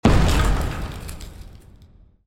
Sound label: Super Ice Cream Sandwich Explosion